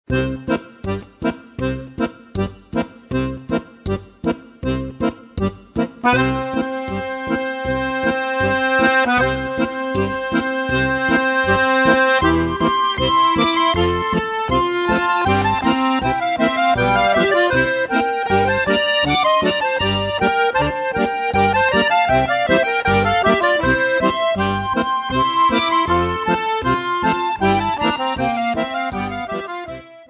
Rumänien, Accordion; Taraneasca; Accordion Trip 2

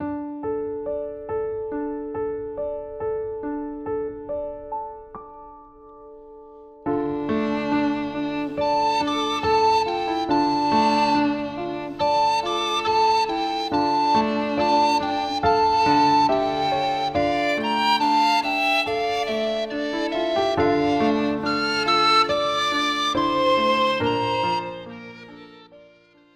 Finnisches Liebeslied

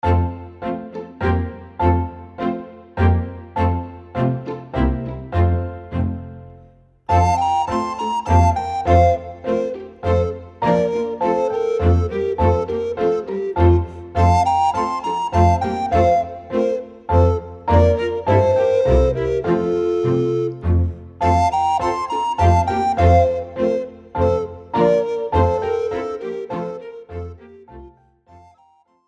Menuett, Floetentoene; Jede Menge Floetentoene 1

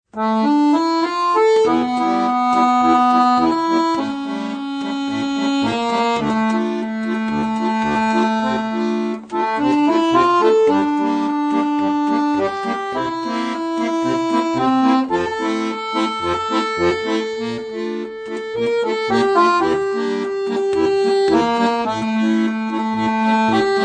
Jazz-Akkorde auf der Bassseite, Akkordeon; Akkordeon Workshop Band 2

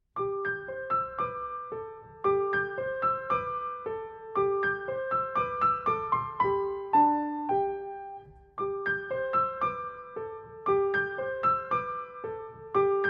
Lustiges Lied; Avanti Musicanti

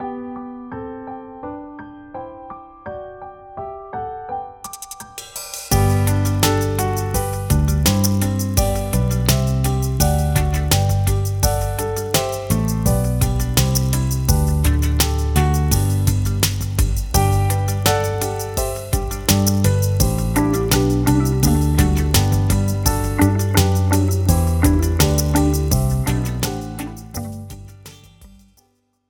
Die Tonleiter G-Dur

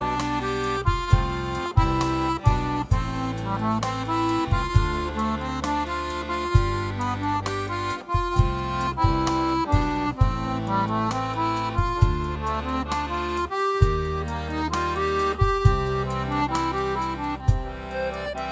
Romantic Pop Prelude, Akkordeon; Akkordeon gefaellt mir 2

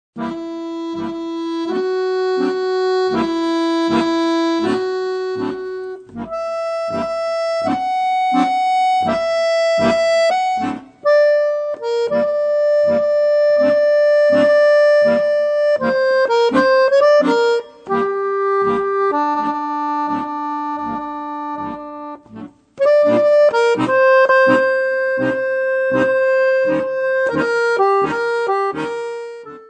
Die Bluestonleiter, Akkordeon; Akkordeon Workshop Band 2

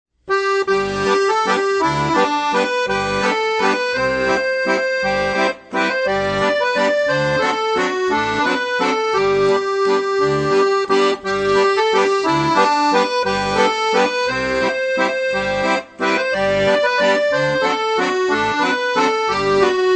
G-Dur-Tonleiter, Akkordeon; The Wild Rover; Akkordeon Workshop Band 1

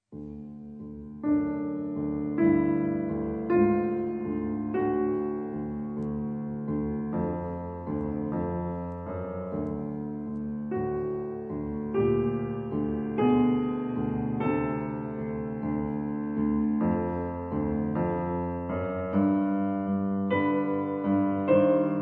Faultier-Blues, klavierisch; Tierisch klavierisch 2

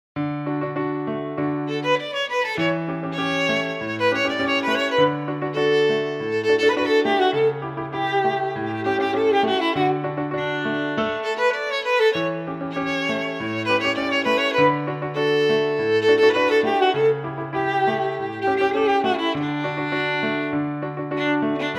Neapolitanisches Tanzlied; Tschaikowski; Aus der musikalischen Schatzkiste 1 - Viola

